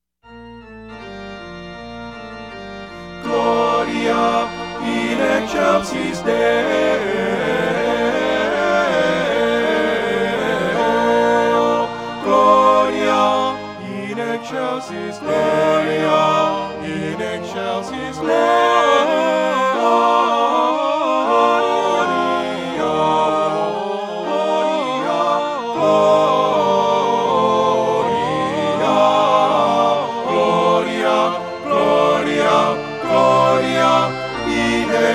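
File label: Fanfare Christmas, ALL; Shaw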